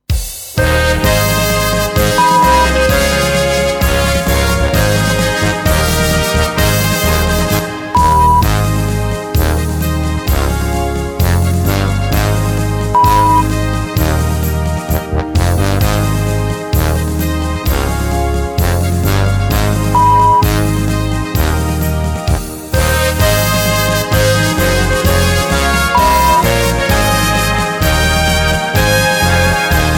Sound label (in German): Prosit Blaeser; Volksmusik, alpenländische, bayerische Musik; Zillertal-Sound, Oberkrainer, Zither